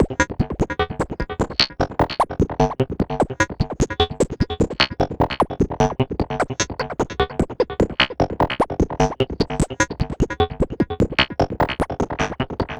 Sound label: Serge Sequence; Electronic Point Blank